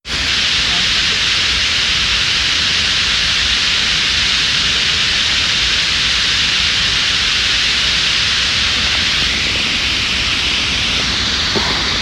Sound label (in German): Bergfinken Rauschen